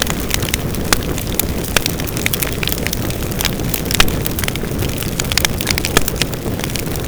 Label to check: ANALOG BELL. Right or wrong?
Wrong